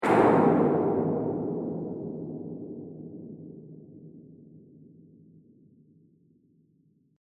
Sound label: Distant Impact; Distant Ko Impact; Miscellaneous Impact Sound Effects